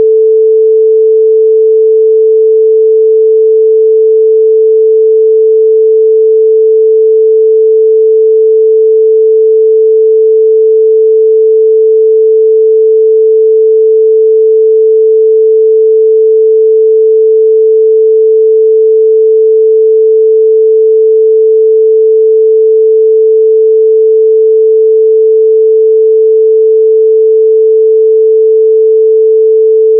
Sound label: sinus 440hz